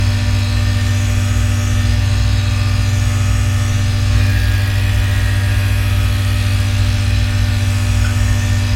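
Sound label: ambient lamp loop, electric; Half Life Model Pack; Half Life Alyx; combine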